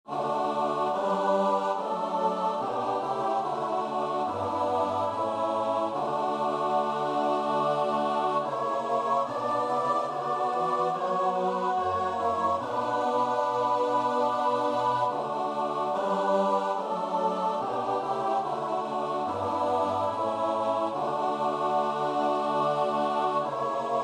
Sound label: st matthew passion, choir; bach